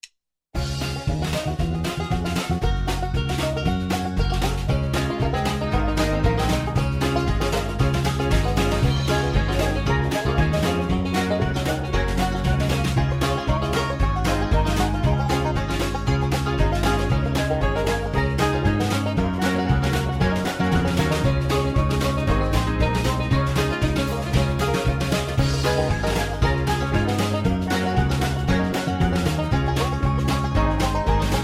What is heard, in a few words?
Low Key